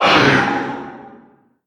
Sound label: vox atk